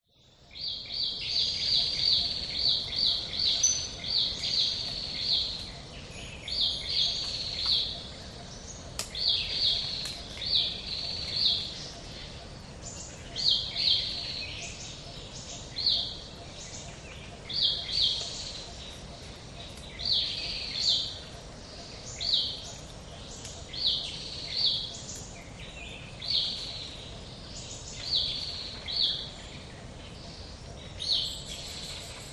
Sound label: forest morning amb birds distant gibbons squirrel close up at 1min cat tien national